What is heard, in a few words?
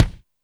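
kick Swift, Alesis D4; 300 Drum Machines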